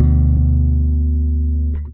E bass (chord) B; Sonic Foundry (Sony Creative Software); Ugly Remnants VOL-2; Basstuff

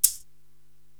Maracas Push, Korg TR-Rack drum machine

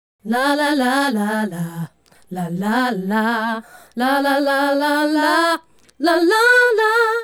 Female Group, Hip-Hop and RnB Vocals

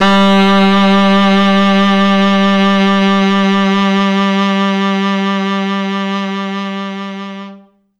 T Sax, g2

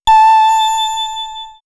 Swirls Pling, pads; X-Static Goldmine

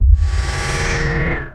FX, attacks; Best Service Advanced Media Trax Modern Composer

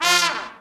SECT BRAS FALL

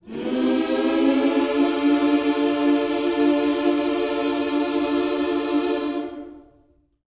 MINOR, MIN.SCOOPS, Vocal Planet, Gospel; Vocal Planet Vol1 Gospel

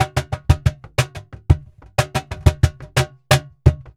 Flamenco, TANGUILLO, 120; Percussion

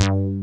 SYN BASS; Pro-Rec - Synthsonic; BASSES

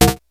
SPLIT; Rubber Chicken Software Sample Bank

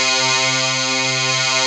SYN JD800; Best Service Gigapack 1

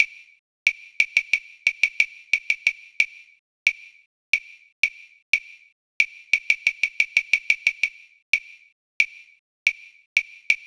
Connected Clave 90, percussion elements

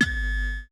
zap, Roland MC-909

